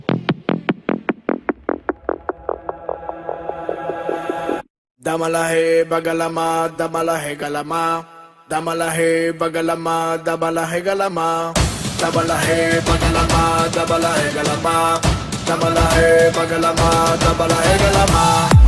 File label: psytrance mix; סט טראנסים